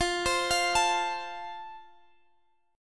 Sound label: F Major, guitar chord